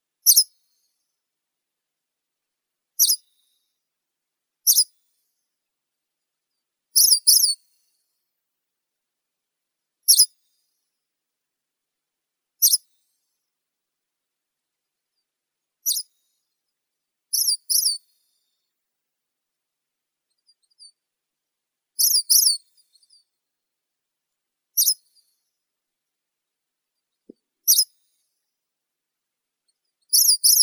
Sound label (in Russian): penochka tusklaya phylloscopus humei; zvuki prirodi